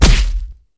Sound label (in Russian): razrubanie kokosa machete